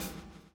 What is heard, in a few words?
BLOW; Spectrasonics - Backbeat